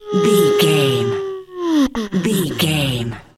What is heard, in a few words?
comedy siren whistle fun down